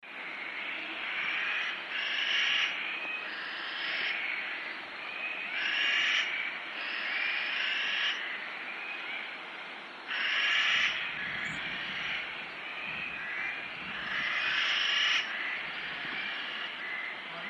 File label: Gray Jay Wyoming Usa